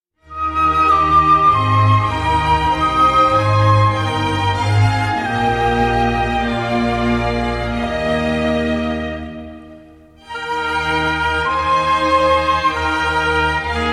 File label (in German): Glockengelaeut